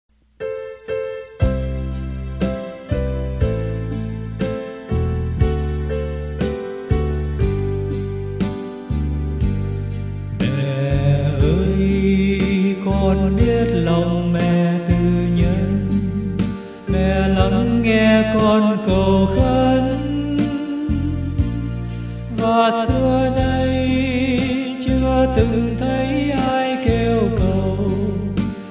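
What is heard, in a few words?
soprano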